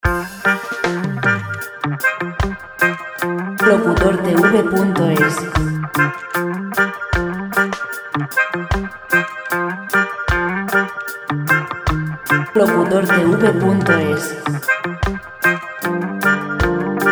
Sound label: 76 folk; folk musica libre